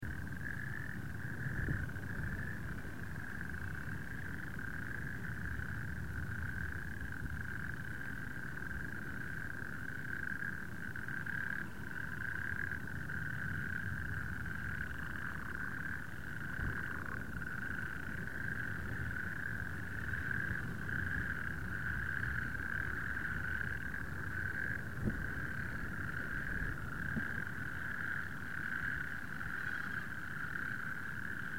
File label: natterjacks